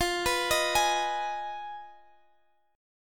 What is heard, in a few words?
F Minor 7th Flat 5th, Guitar, Open G; down; chord shape